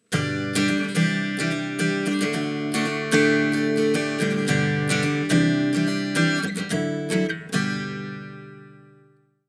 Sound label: guitar, ringtones; Coolpad; Mega-2.5D